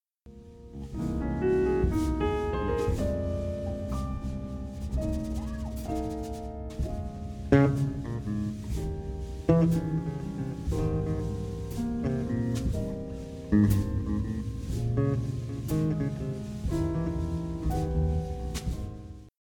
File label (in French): extrait ballade